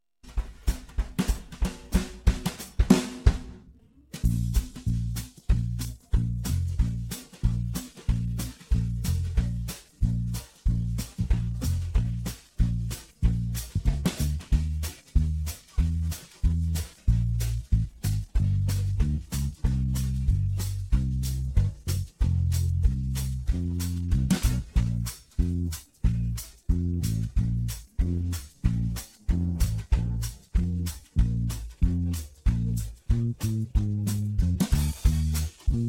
western swing solo

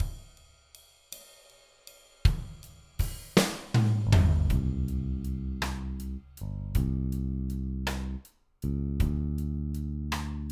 soulful 6 8 country solo c maj, backing tracks

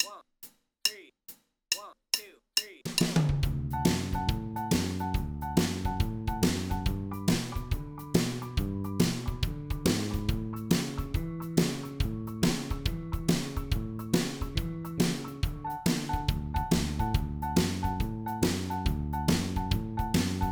honky tonk solo in d, backing tracks

